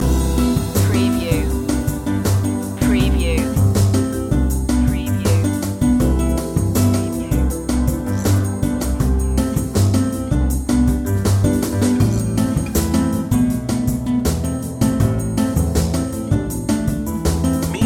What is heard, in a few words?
warm place loop